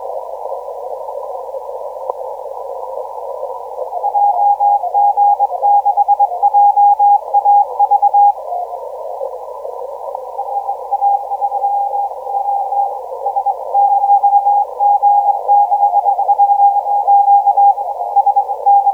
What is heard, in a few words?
12m